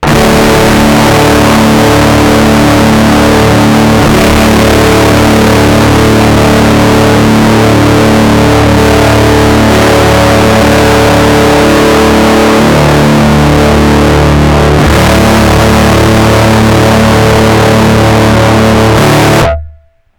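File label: rat guitar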